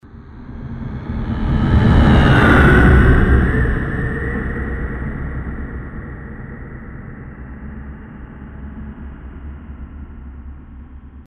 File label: Spaceship dopler; Sound Graph